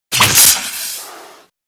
Snake rapid strike attack

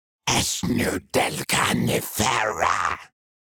Demon beast imp monster spell casting

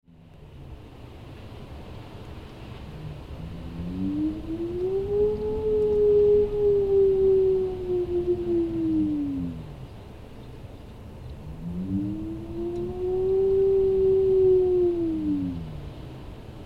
Wind howling and whistling through window

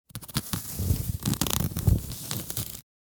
Rough burlap scratching

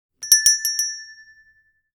Convenience store opening with a bell ringing

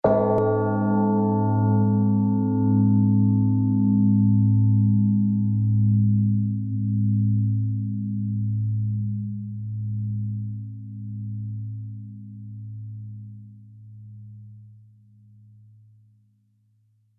Death bell toll ring funeral grave; large bell

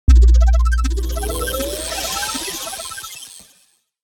Slot machine casino multiplier win boost

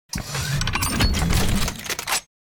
Sci-fi weapon reload rifle reloading

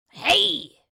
Witch fantasy vocalization short shout

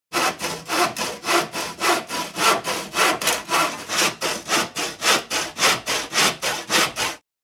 Handsaw cutting wood sawing wood

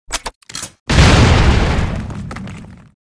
Anti personnel mine detonating explosion

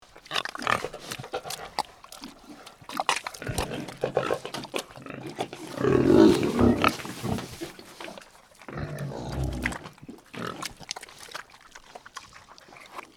Farm pigs mud activity ambience loop

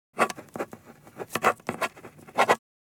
Ballpoint pen writing on paper

